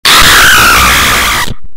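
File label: krik zvuk, scream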